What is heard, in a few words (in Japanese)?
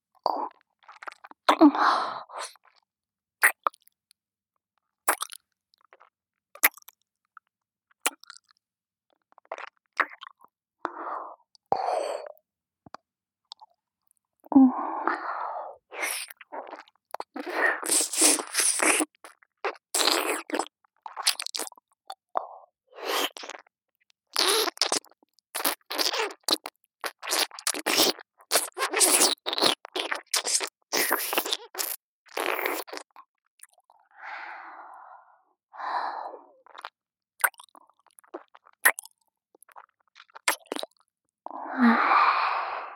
左耳舐め (ループ); 無知生徒の左耳舐め